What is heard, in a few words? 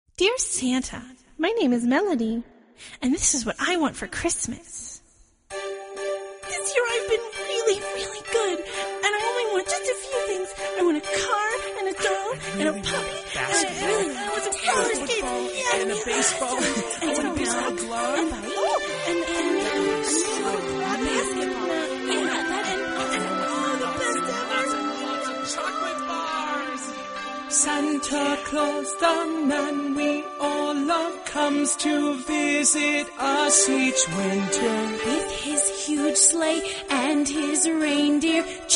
melody